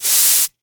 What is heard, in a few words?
Hydraulics; 1001 Sound Effects